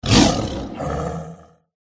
shankara attack voice up

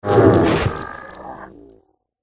death; haosa

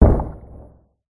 cat attack contact bottom

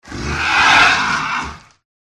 mini dragon attack voice middle

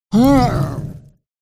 dark prince attack voice up

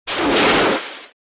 Fire Spirit Die

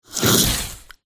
bug pet buff; pet petia

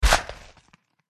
dog attack contact bottom

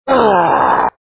zombie death

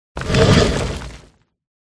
defender attack voice up

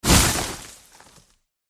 tree attack contact up

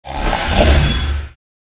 Dervish

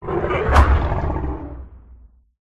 boss attack voice middle; krofdor

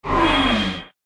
Earth Spirit Wound

